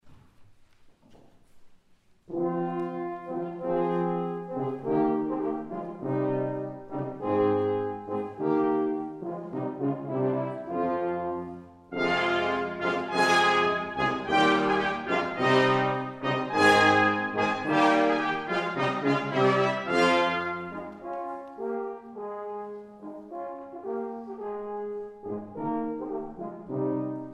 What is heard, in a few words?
Brass Good Company; Pastime with Good Company; BAMS Christmas 2013